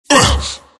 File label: pain; tf; skeleton king